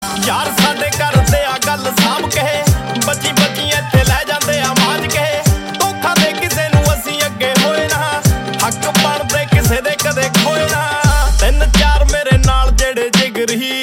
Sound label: Ringtone